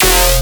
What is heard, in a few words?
Bass Hit E, Dubstep; Shine Bass; Ghosthack; AC2024 - Day 13 - Dubstep Sounds 2025